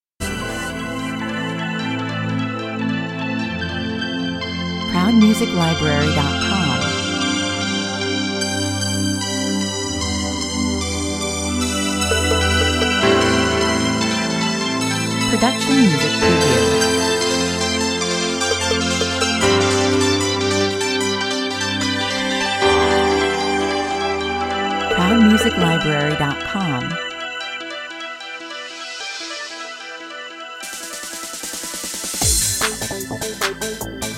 add lead guitar

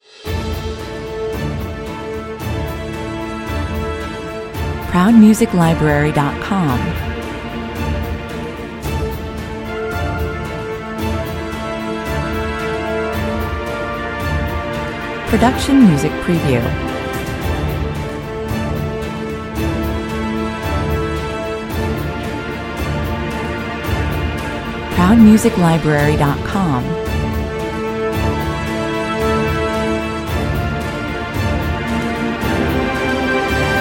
no choir

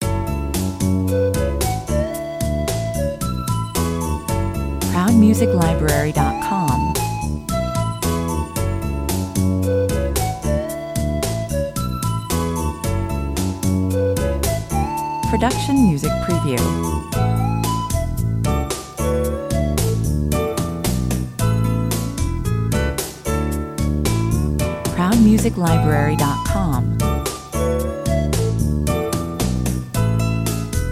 elevator 115 bpm

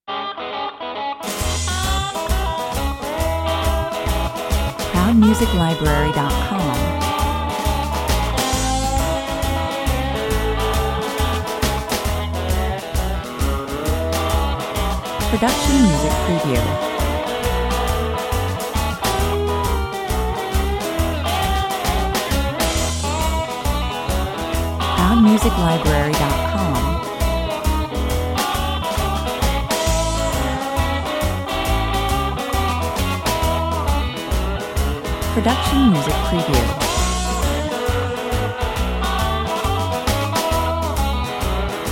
blues 135 bpm

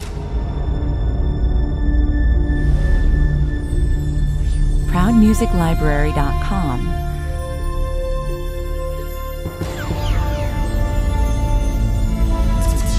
100 bpm

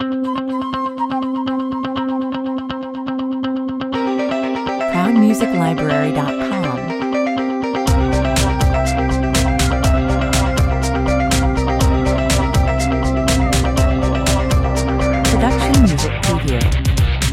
changing gears 120 bpm

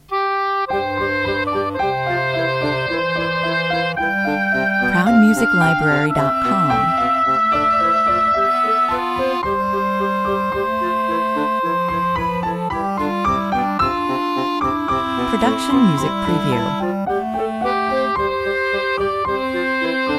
classical quintet 110 bpm

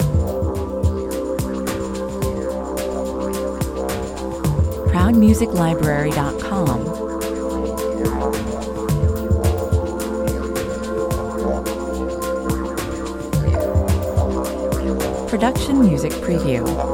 corporate kit 108 bpm

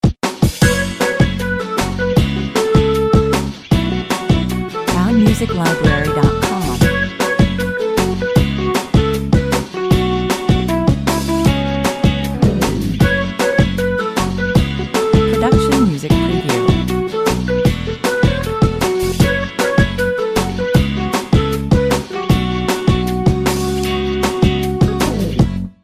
Short, happy pop track, 152 bpm

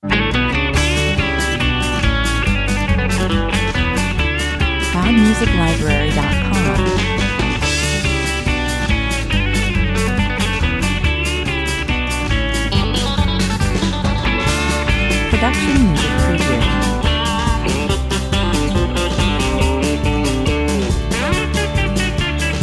country 70 bpm

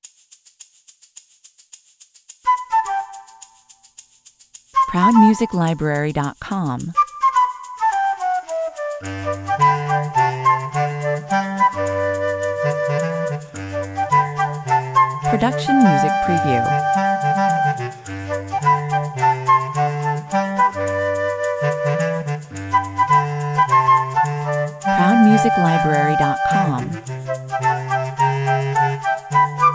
childrens calypso

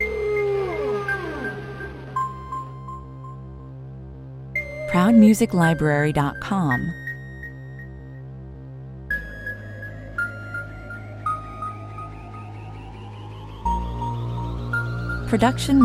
amiga, 94 bpm

